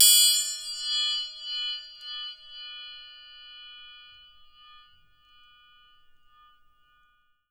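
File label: TRIANGLE P right, percussion; Miroslav Vitous - Percussion & Harp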